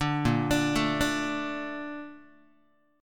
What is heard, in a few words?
A sharp Major 7th, Guitar; A#M7; arpeggiated; JGuitar